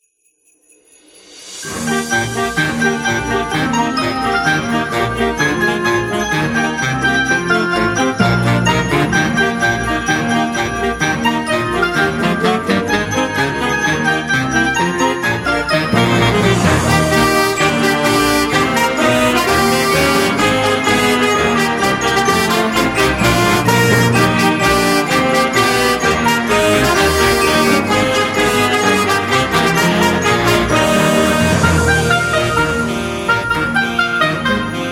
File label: Jingle Bells; snow